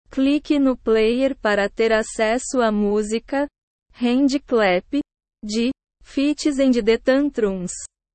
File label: handclap